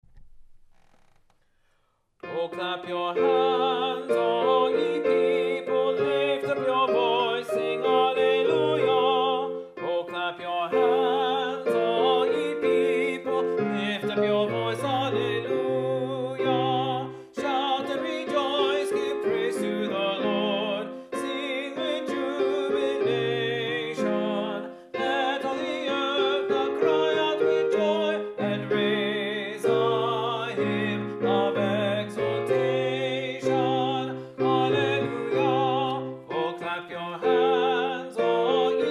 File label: Tenor; O Clap Your Hands, V. Johnson